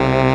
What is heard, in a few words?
DANCE SYNT, techno trance; E-MU Formula 4000 Series Vol. 2